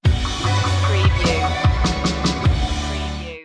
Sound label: stinger; rock; indie rock